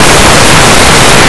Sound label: rattle; Blue Heat